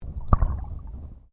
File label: Underwater Movement Swoosh; Submerged impacts created by dropping a weighted rope into the ocean; Splash Sound Effects